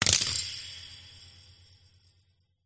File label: ancient game metal spring release hit gear open slide texture